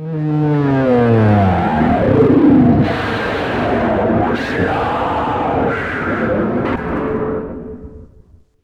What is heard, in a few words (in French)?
JINGLE avion